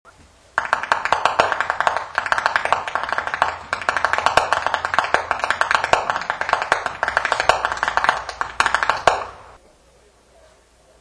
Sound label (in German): schnalzen, flachgau; liefering